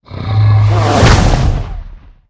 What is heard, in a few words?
snake attack